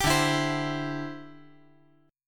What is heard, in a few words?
D 9th Suspended 4th D down